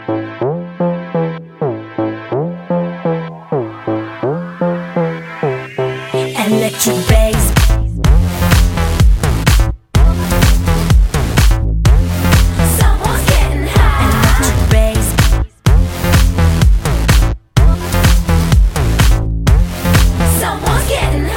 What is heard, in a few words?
electric bass; electronic